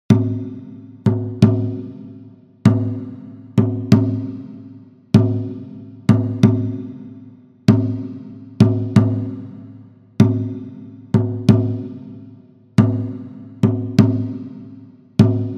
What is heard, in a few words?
groove; Kingdom Of Fantasy; Groove: Execution Drum